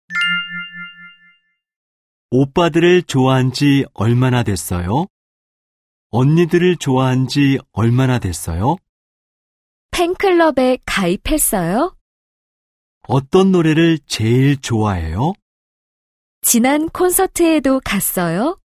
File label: K-POP